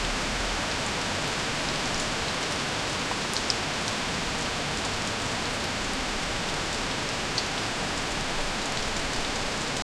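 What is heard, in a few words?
intense rain pouring on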